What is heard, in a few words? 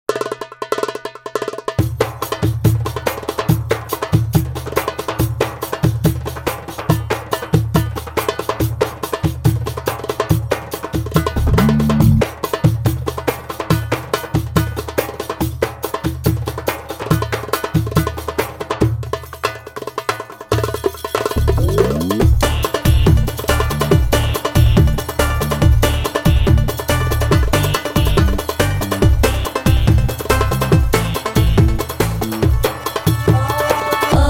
Music